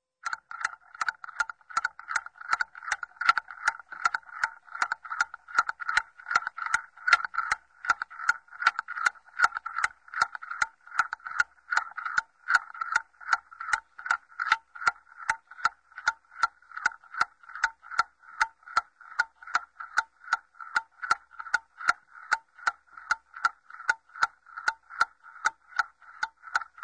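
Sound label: clock ticking; Тиканье часов для монтажа в разных видах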